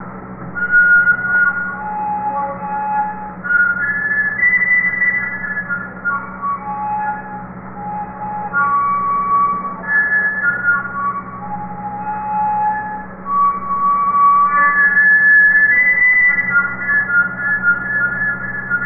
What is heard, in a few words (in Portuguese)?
sopro